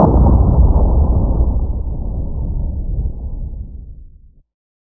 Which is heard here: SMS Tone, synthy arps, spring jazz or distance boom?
distance boom